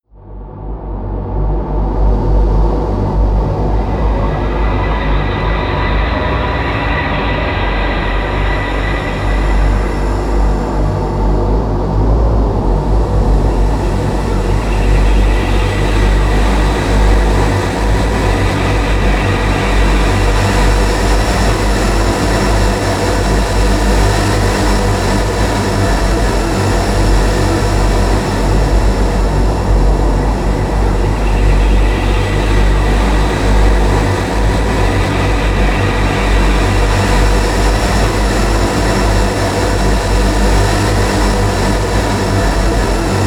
drone howl

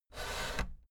fader plastic scrape double down medium

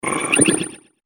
space frog

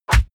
punch oldschool

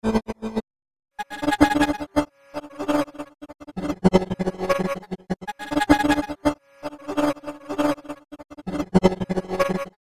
broken synth

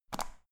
power button turn on off hard fast

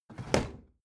bathroom drawer open heavy

